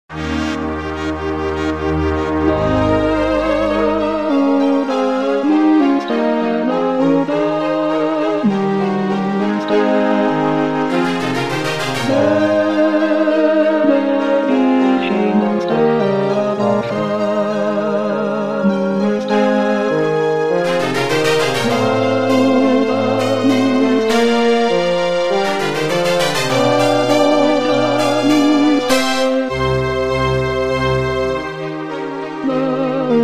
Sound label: Tenor; Puccini; Messa; Gloria